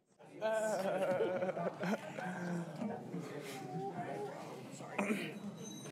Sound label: giggles; audio meme